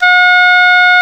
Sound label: Oboe A#3